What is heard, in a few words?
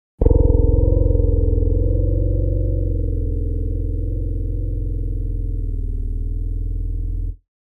thunder